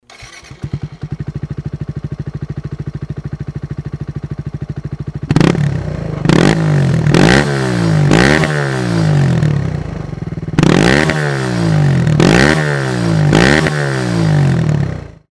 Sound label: z125 hotlap; z125pro